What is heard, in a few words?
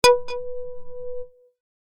success